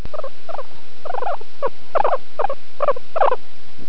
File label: happy, guineapigs